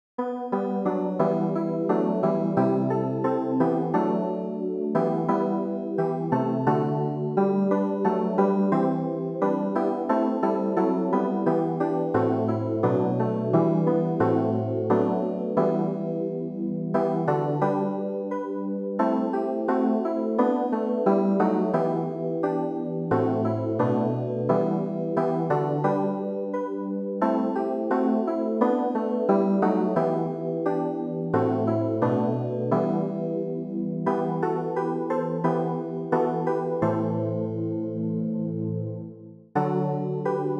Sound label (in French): CLAVIER